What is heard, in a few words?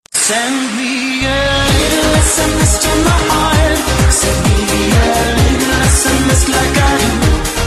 Little Sms